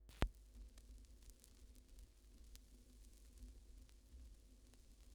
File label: Vinyl Crackles